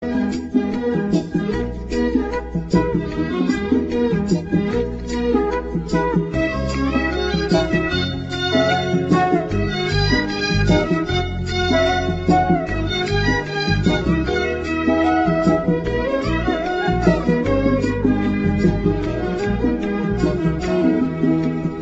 sudanese music

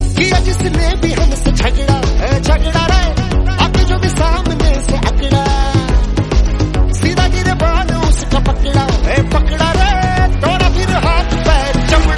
hindi song

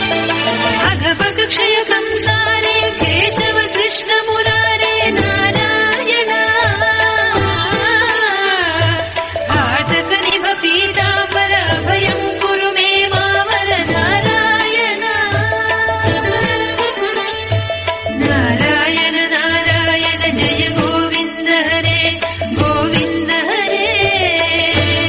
telugu

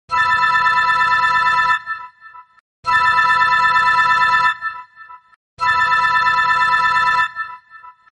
iphone 12 radar alarm

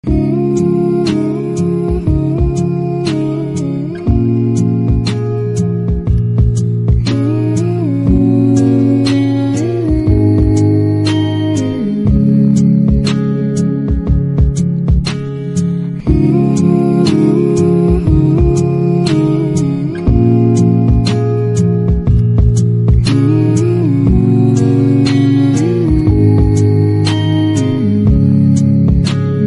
late night vibe instrumental

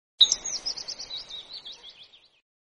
bird cicada notification bird notification

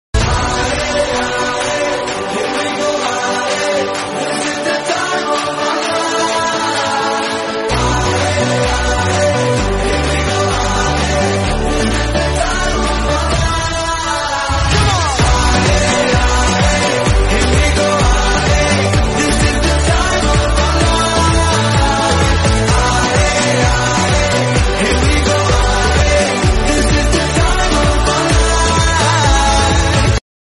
world cup song

